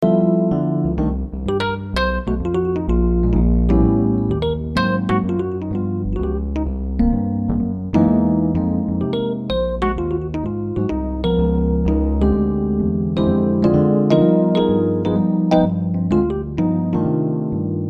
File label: jazz song; Rhodes